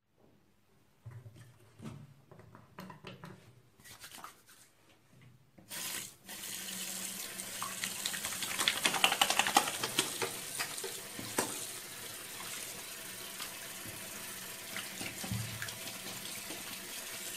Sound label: Washing hands; human